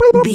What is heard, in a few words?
voice fun character flutter man